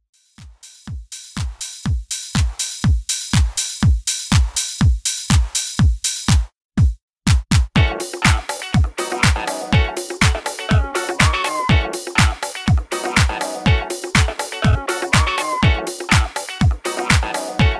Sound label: 90s Classic Mix 122 Bpm